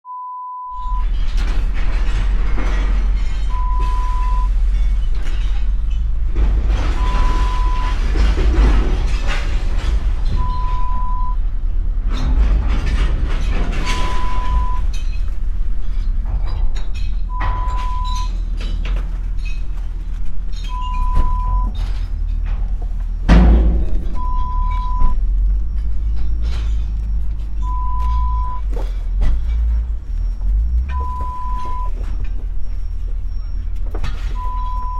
Recycling Centre

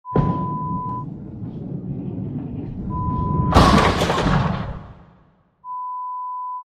Bowling Strike

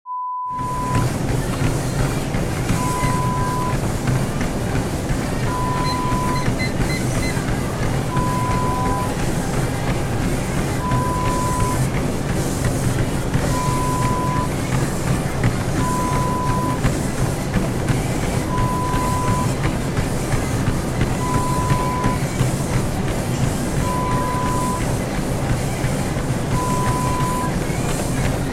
Gym Ambience sound

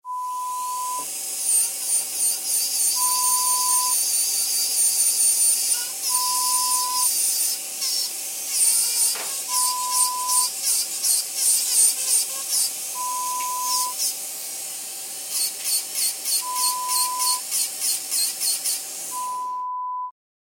Dentist Drill sound

